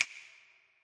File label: Snap